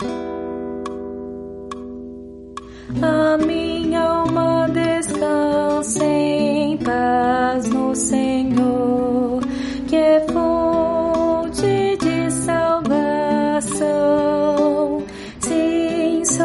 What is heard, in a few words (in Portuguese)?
contralto